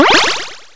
power

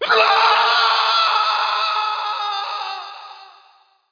fall death; Player; Heretic II

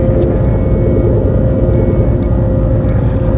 amb tech

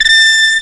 Flourish

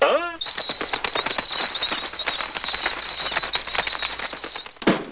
clock

